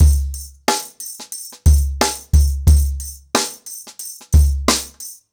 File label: Nuclear 90BPM, ACOUSTIC DRUMS